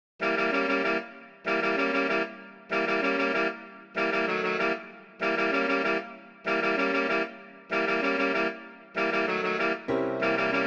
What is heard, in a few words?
Tenor Saxophone